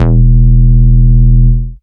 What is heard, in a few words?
Root E, Bass